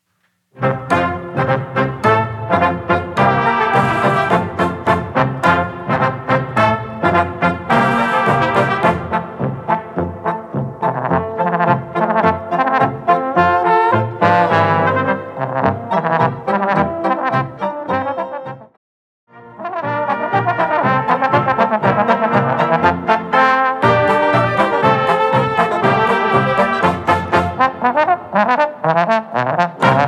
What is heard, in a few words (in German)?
Polka; Bayerische